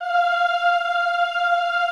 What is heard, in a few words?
OOH F4, Classical Choir; Best Service; Extended Classical Choir